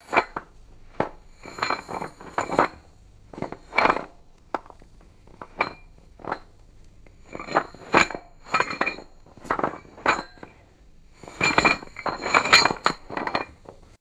heavy metal bricks clanking and shuffling

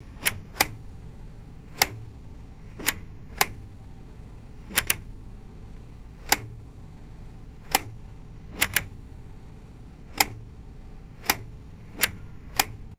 plastic car ventilation switch clicking; mechanical

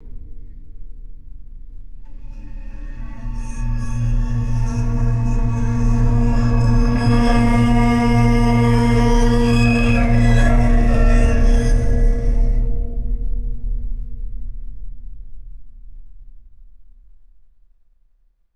swelling metallic screech and dragging growl

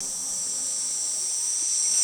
raspy high pitched insect hiss; animals

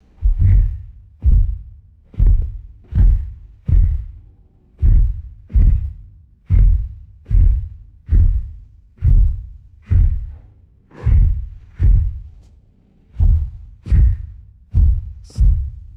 slow heavy low pitched footsteps

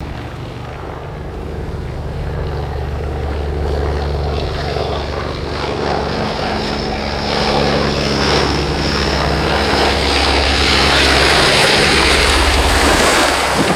float plane landing on water with splashes; aircraft

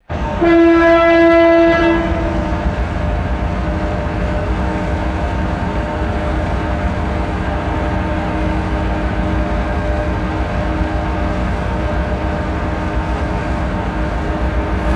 deep ferry horn blast with echo; boats

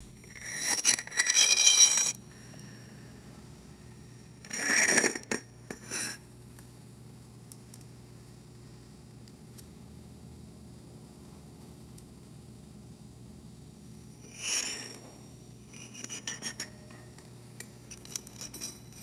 gritty rock grinding on glass

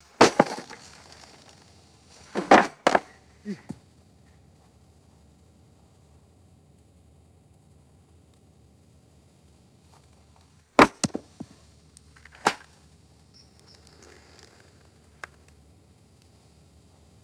wooden pole dropping and dragging on dirt

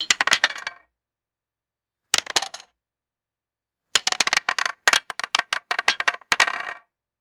small crisp wooden impacts and clatter

